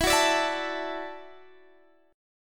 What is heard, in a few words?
E 7th Suspended 2nd Suspended 4th, down, Guitar, Standard; strummed; capo 9